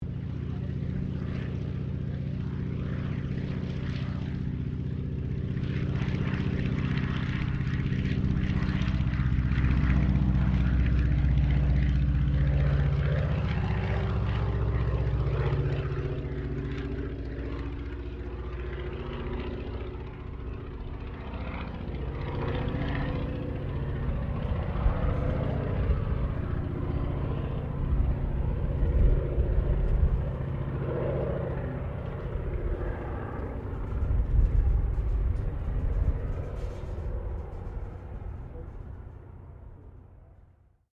Aircraft Sopwith Pup Replica Takeoff; Replica of a WW1 Sopwith Pup; Airplane; 1916; Airplanes

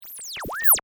Radio Tuner, Scifi, Oscillation, Zap; Science, Fiction; Communication; BlastWave

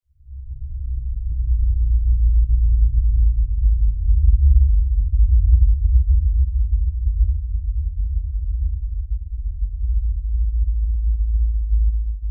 Lfe Descend Low Bass; Descending; Blastwave

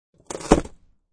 Thin Board Splinter, A thin board is splintered; Splintering (Sound Effects:Objects)

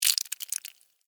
Blood Spill Drip, Horror, Blood, Spill, Drip; Blood and Guts; Blast Wave

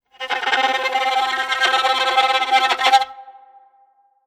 Violin Trill, Single tense Violin trill.; Strings; Musical

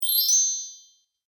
Magic Fairy Wand Wave

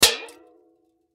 Can Drop Liquid Slosh; Drops; Foley; Blastwave

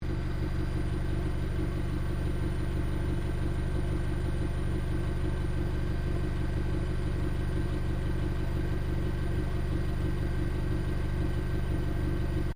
CAR SUBARU LIBERTY EXTERIOR IDLE LOOP; Subaru Liberty 20 01; Family members Subaru; Vehicles

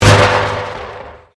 Convolution Pulse

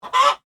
Chicken Squawk, Animal, Chicken, Squawk; SoundEffects-BlastWave

